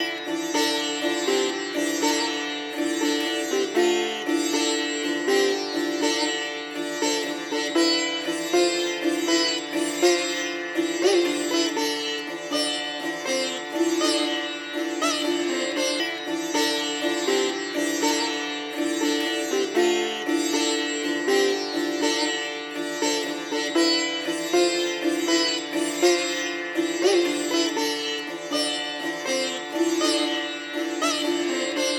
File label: Sitar; raji an ancient epic; rangda